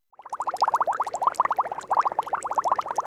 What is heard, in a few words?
BUBBLES